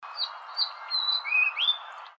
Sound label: fox sparrow; (With the noisy Beltline in the background)